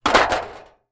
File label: foley metal sheet thin put down on surface wobble; zapsplat